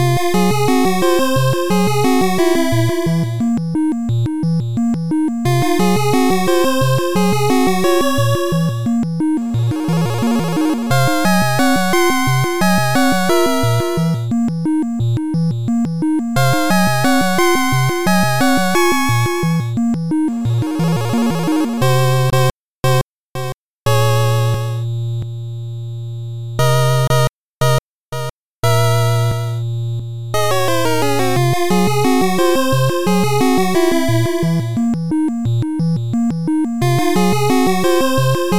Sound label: 8bit Spooky Cave